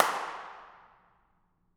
bathroom, Impulse Responses, Reverbs; BU Reverbs

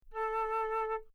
flute A4 mezzo-forte normal

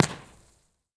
Beach; Divorce; Impulse Response; EchoThief Impulse Response Library

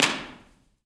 Hall, Impulse Response; Galbraith; EchoThief Impulse Response Library

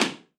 Four Points Room 270, Impulse Response; EchoThief Impulse Response Library

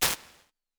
Gated Ambience, Lexicon 480L Reverb; impulse responses; Big Gee's

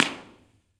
Courtyard, Impulse Response; Humanities Social Sciences; EchoThief Impulse Response Library

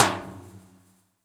Tunnel; To Heaven; Impulse Response; EchoThief Impulse Response Library

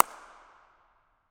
chapel, Impulse Responses, Reverbs; marsh; BU Reverbs

